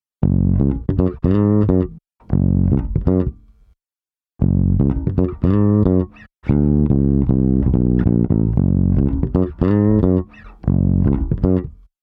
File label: Bass High Ratio; Pulsar 1178